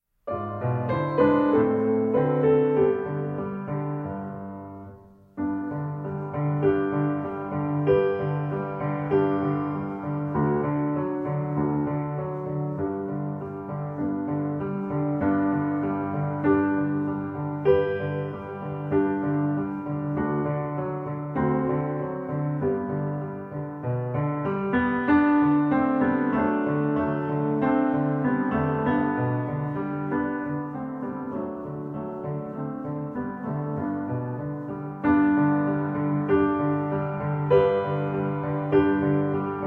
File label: Piano Accompan; 2-16 Long Long Ago; Suzuki Violin